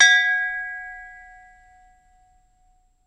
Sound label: Champagne Toast Ding, glass clinks